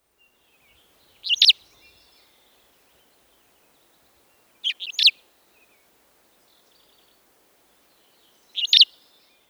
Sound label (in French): Finch de Cassin cri